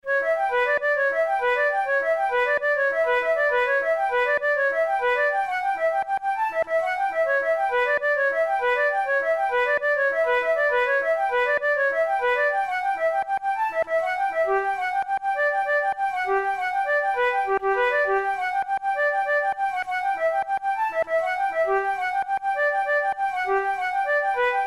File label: campbell paper year, flute